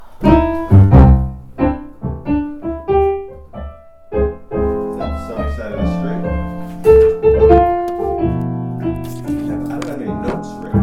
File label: Instrumental